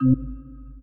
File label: Button press